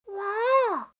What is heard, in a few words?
waa, reaction